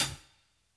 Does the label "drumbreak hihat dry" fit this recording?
Yes